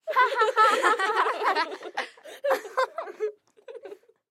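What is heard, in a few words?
female laughter sounds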